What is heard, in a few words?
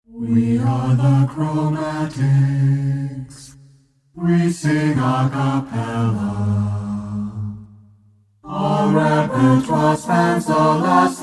Chromatic Organum